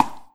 Foot Step; Bora

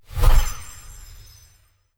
Artic Spell

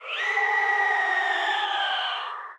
Death; Likho

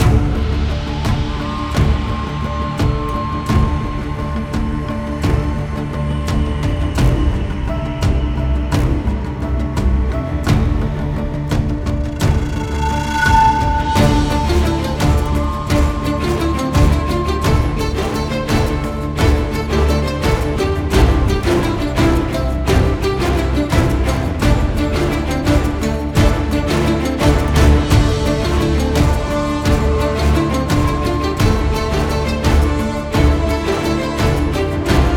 Land Combat Jungle Night

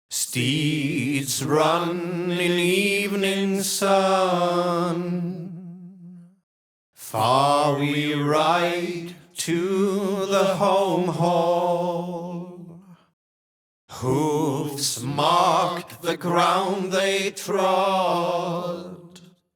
drum vocal chorus tagelharpa flute

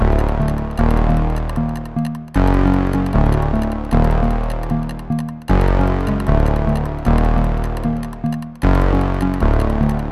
BGM dungeon jail

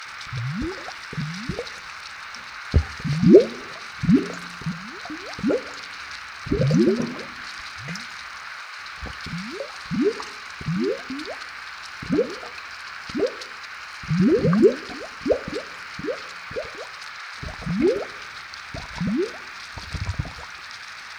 Cauldron Loop

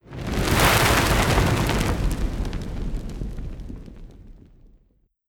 Hydra Fire

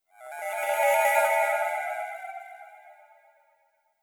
Satyr Flute Spell; Legend of Keepers (gamerip) (2019)

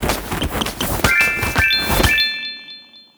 Se Gacha

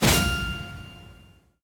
Quest complete

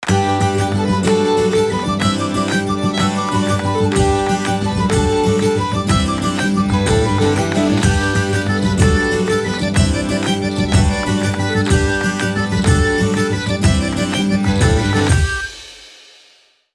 minigame win; MiniGames

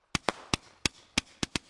Partymode Crowd

